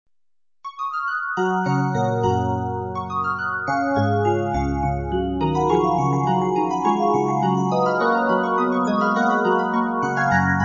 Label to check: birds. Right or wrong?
Wrong